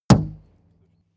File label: plastic canister hit hard